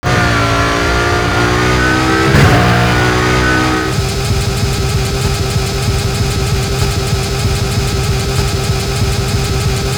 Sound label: throttle back on low, vehicles